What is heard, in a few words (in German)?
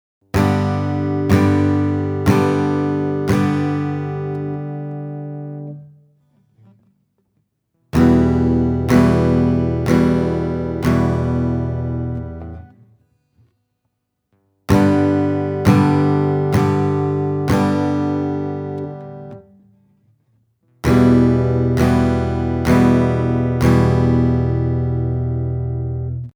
Akkorde wechseln bad